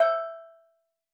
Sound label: SteelDrums E5; Calypso; Percussion